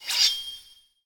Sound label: katana gesture